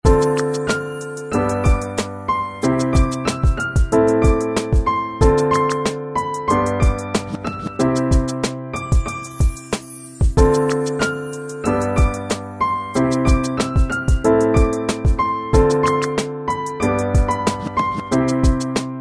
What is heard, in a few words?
3step mix